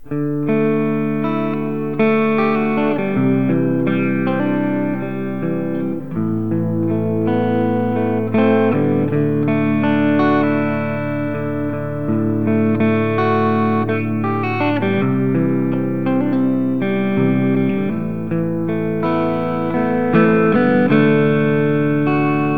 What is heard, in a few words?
Clean Boutique